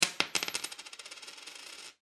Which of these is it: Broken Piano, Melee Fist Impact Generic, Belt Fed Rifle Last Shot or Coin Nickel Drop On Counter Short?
Coin Nickel Drop On Counter Short